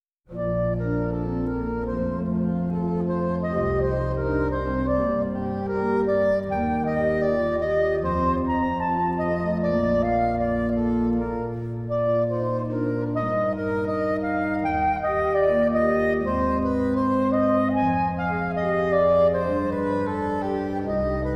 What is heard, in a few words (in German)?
Bach Sonate C-Dur, midi; 1.Satz